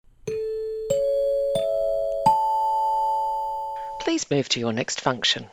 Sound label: four chimes ascending please move to your next function